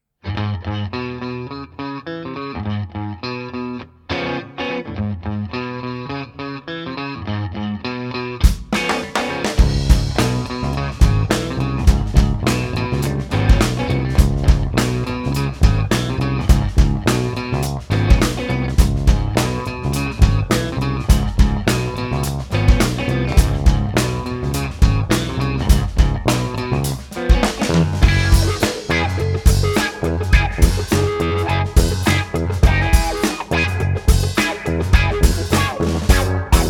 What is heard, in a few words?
Chicken Bone Train, blues